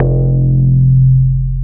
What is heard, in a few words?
bass, 160 BPM, drum & bass; Ueberschall; Drum & Bass Flash Trackz